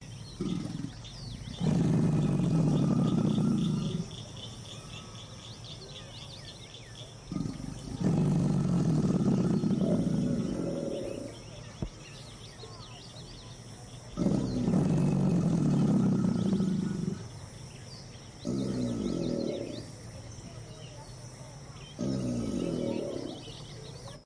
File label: alligator bellow; Zoo and Nature